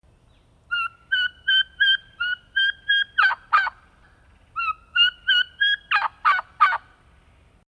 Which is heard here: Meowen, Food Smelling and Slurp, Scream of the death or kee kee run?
kee kee run